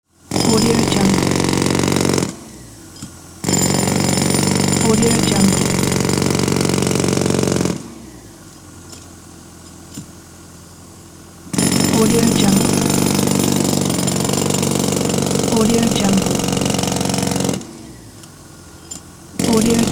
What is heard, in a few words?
construction jack hammer